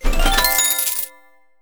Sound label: jackpot win